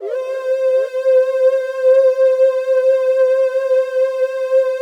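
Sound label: PAD, atmospheric pads; Best Service; XX Large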